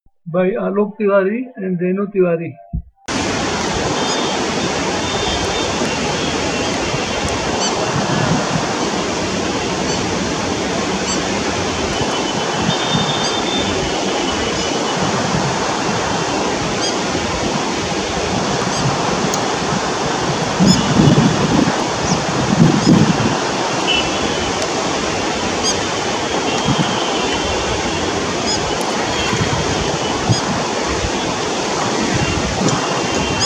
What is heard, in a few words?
River lapwing; alok